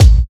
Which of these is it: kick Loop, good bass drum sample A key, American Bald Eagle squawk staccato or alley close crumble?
good bass drum sample A key